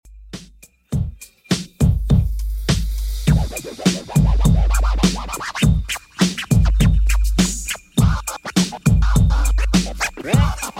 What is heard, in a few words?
2ks Redrum 102bpm